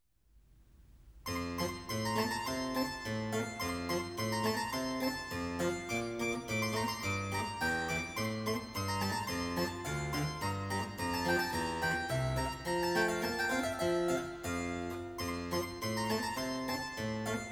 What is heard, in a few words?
Mozart Piano Piece In F